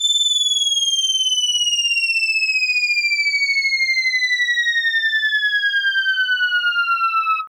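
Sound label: background music; Mega Twins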